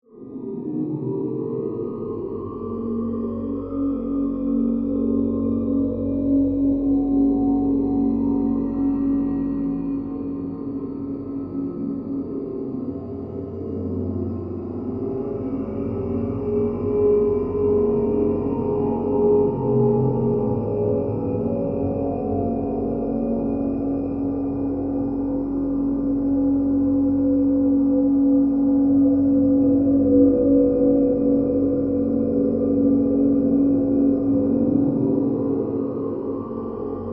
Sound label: Bed Eerie Flange, Production, Element; Beds (Sound Effects:Sound Design); SoundEffects-BlastWave